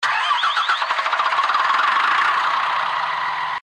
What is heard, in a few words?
rover engine start; Code7